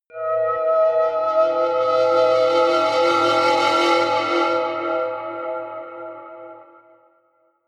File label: soft hum drone high, drones; musikelemente